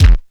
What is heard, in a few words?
base drum; DRUMS